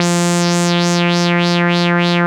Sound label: WAH WAH F3; CMP - Analogon